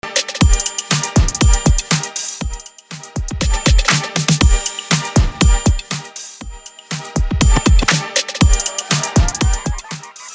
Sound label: intro extended 120 bpm